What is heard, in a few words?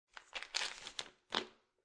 Pill Bottle Large Open Cap